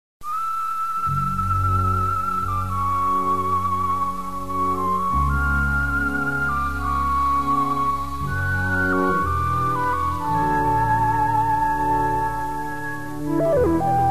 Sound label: relaxed synthesizer